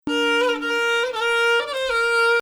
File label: Ajam